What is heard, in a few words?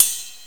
ride cymbal cup; Software Explosion 2 - Virtual Computer Pets; yamaha xg; drums